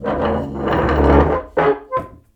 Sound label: chair move; DeadFright